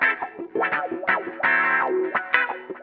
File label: GUITAR